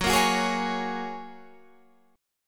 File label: Gb Major Add 9th, down, Guitar, Standard; strummed; JGuitar